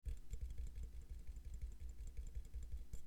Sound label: Rumble Omni